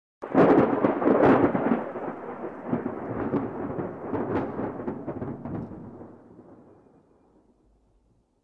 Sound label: light thunder crack and rumble